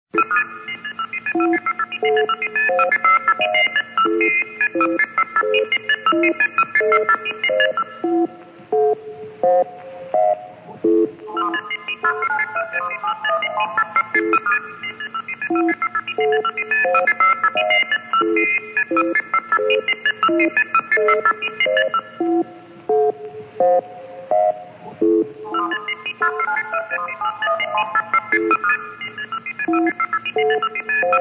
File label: Monitor Beacon